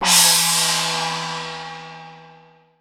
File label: WU HANG G, GONGS; Voice Crystal - Steve Reid Definitive Percussion